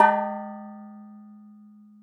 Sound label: Noggin, Classic SFX, cartoon; musicradar; cartoon caper